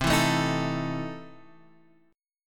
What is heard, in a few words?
E Major 7th Suspended 4th Sharp 5th, C